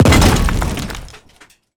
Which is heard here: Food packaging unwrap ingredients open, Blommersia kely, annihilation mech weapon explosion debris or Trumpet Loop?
annihilation mech weapon explosion debris